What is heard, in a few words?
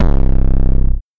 808 retro